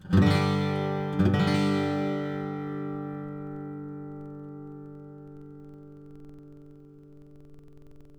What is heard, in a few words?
Model J Mahogany